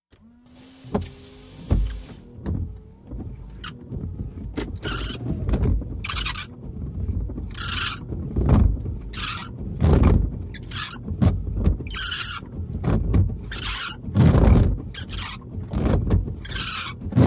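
Mitsubishi Montero interior: Windshield wipers with squeaks & chatter; VEHICLES MITSUBISHI MONTERO: INT: Windshield wipers with squeaks & chatter.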